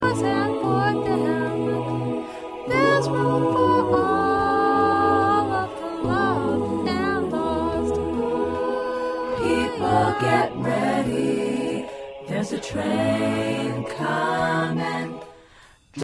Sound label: SATB